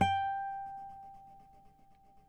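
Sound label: nylon, FLAMENCO, Guitars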